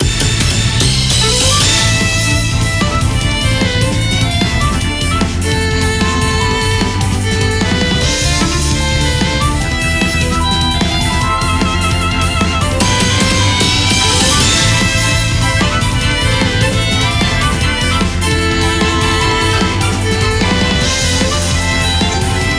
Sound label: cross heart acoustic; collaboration